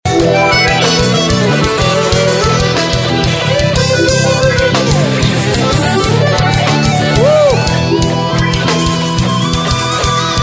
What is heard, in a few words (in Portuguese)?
abertura forró